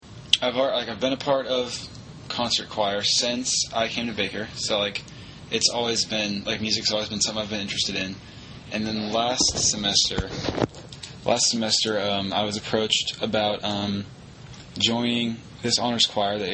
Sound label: honor choir